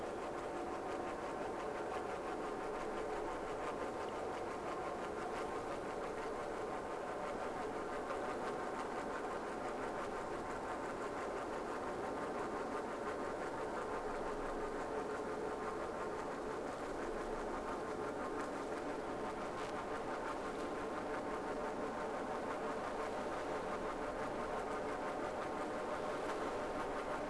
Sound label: Whales; SeaSnake